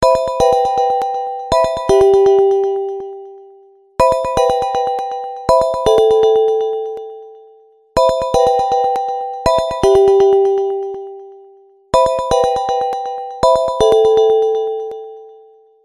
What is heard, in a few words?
basic ring ringtone; iphone